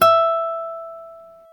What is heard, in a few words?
GTR Nylon, Nylon Martin